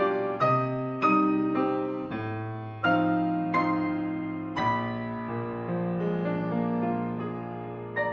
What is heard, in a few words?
piano